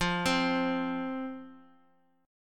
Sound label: F 5th F, down, chord